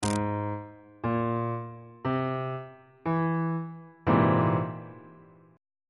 d double flat augmented 7th 2nd inversion; bass clef

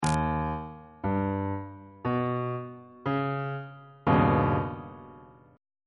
e flat augmented 7th chord on bass clef

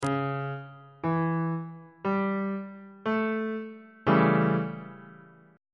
a dominant 7th 1st inversion on bass clef

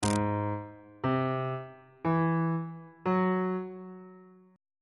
a flat augmented 7th chord intervals

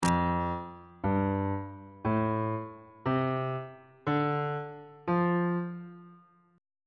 f major pentatonic scale

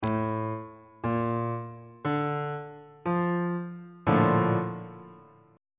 a sharp major 7th 3rd inversion on bass clef

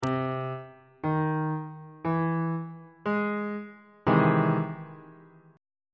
e augmented major 7th 2nd inversion on bass clef

